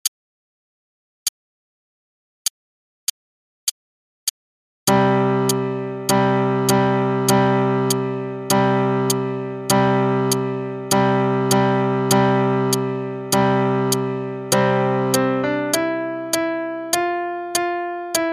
African Celebration (qn=100), Traditional African melody; Faber Adult All-in-One; Adult Book 1 (All-in-One)